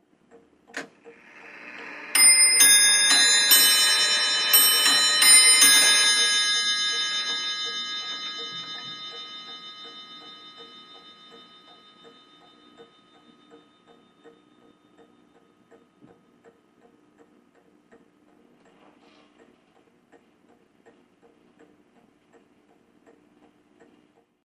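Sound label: Old Mantle Clock Strike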